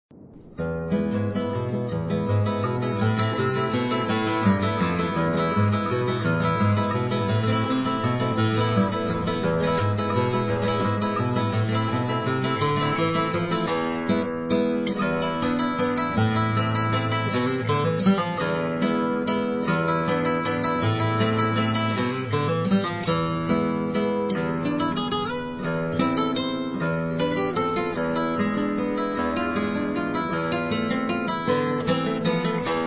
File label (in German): gitarrenspiel